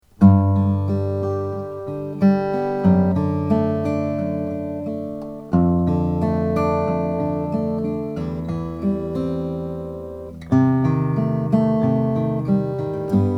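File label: tippin 000 12t asian brazilian ro, guitars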